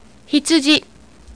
sheep

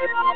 Teeth Sound; SpreadBase

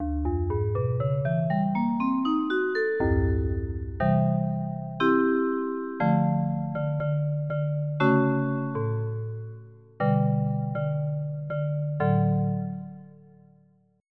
Crystal; EverQuest

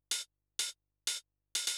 HIHAT HOUSE 125, Drum; eJay

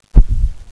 Thump; Princess and the Pea; SoftKey

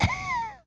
gag; Despair 1.6

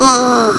groan; billy the burglar

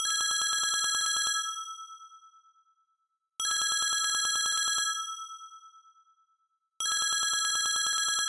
phone

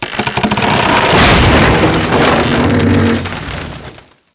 airship damage; DAoC

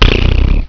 Blthlthlthlth; Jotto ][ 1.0

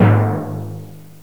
Timpani G#; GMPlay; Drums; TG300B

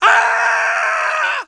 pain; Grounch

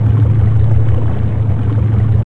pipe lp; thief

